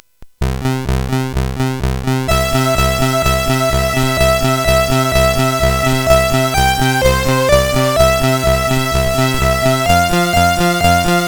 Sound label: Jingle Bells, sid, CSG8580R5